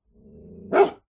animals dog medium sized growl into bark med close